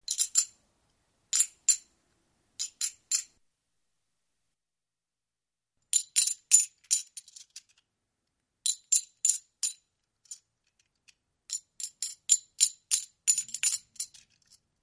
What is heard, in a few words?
Coins Count Several C